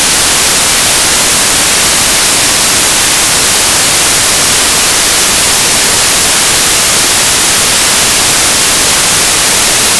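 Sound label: white noise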